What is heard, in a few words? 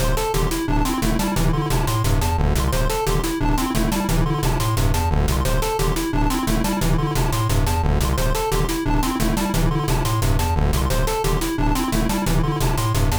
beep